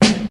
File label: good snare single hit g sharp key; snare drum